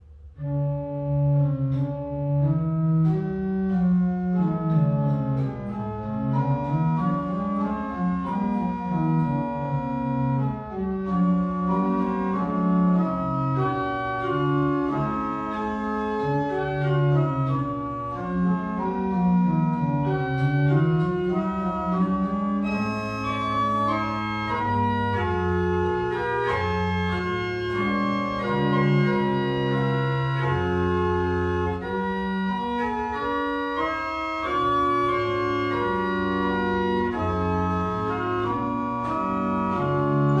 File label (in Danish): Orgel, Kirke